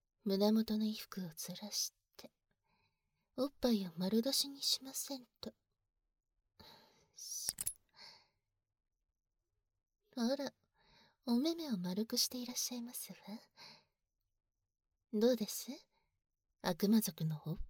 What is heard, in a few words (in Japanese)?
パイズリ・極悪フェラ